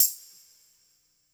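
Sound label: tambourine wet; InVision Interactive; Percussion Slam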